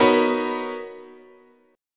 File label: B Major 9th, chord, guitar